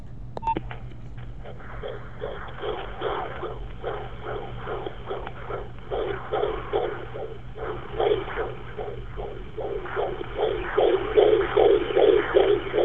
The First recorded sound of Boo's Heart beat. 23 weeks old.